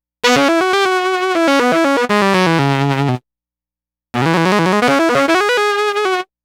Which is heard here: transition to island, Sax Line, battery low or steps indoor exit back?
Sax Line